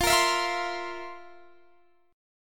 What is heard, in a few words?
F Major 7th Suspended 4th, Guitar, Open D; down; chord shape